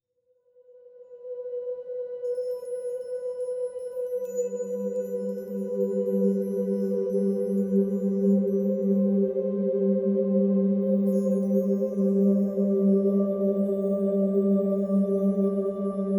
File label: ambient atmosphere